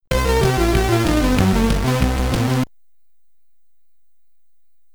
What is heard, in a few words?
Lose, SID Stereo; pro tennis simulator; commodore 64